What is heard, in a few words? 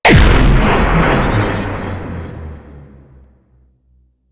Antimatter Burst; Warframes; Mole Prime